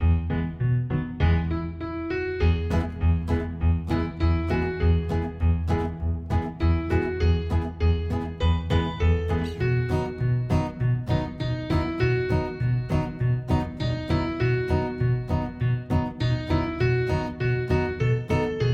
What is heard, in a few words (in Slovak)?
klavir; piknik